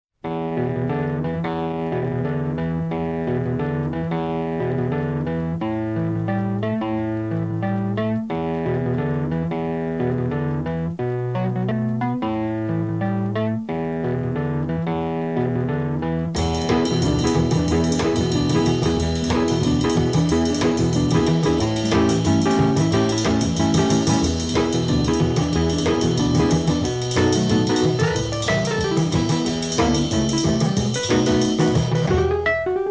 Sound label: wurlitzer